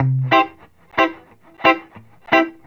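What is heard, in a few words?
reggae electric guitar C; Best Service Advanced Media Trax Modern Composer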